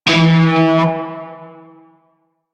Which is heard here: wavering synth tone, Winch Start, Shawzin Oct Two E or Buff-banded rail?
Shawzin Oct Two E